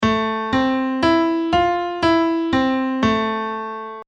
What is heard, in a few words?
Minor Scale Piano